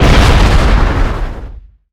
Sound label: Explo Medium; SFX Kit; Destruction